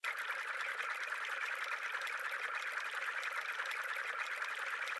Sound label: Spin; slots; Ninpo Slash